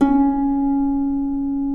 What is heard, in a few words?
Harp C#3; Digital Sound Factory Virtuoso